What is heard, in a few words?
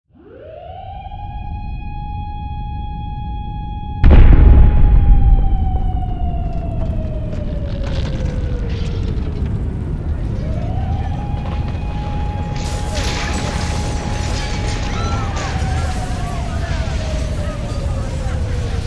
Nuclear explosion deep rumble massive bomb